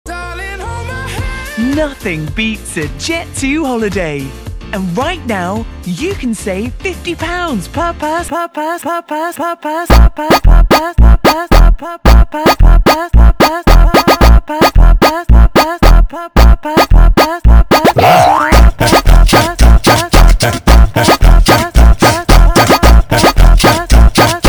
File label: Funk slowed